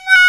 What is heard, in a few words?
Trpts